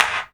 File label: CLAP, Dance Drums